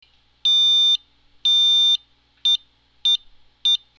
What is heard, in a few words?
pi-pi- pipipi